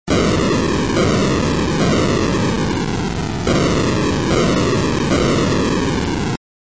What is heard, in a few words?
boink